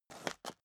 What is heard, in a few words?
step high heels concrete grit scuff; schritte; Footsteps; menschen